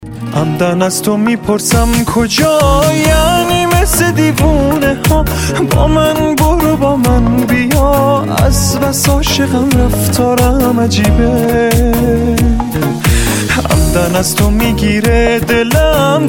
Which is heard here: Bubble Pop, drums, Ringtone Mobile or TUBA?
Ringtone Mobile